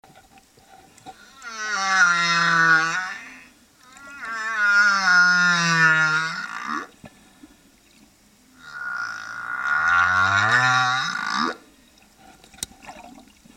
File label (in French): son baleine; suez